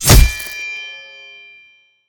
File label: charge spear hit; smite; god odin